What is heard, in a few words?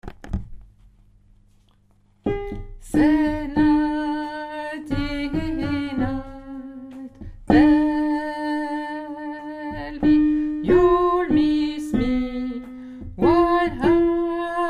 mezzo